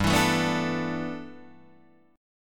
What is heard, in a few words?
G 6th Add 9th, Guitar, Open D